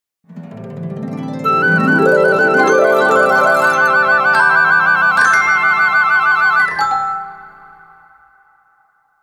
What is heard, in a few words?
Cinematic Sting